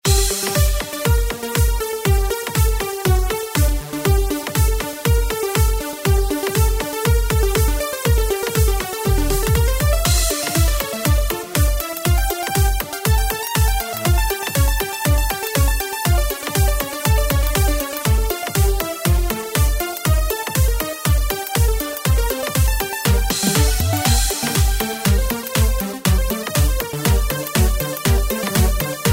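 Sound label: FUGUE IN G MINOR ALARM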